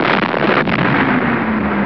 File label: explosion; ST-XX Amiga Soundtracker Sample Packs